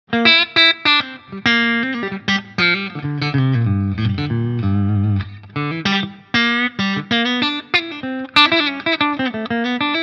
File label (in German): Harley Benton HP 42 clean Mitte Stegtonabnehmer als Humbucker